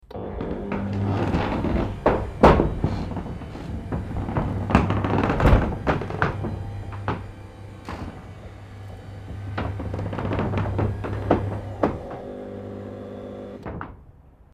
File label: door roller aluminium garage electronic lower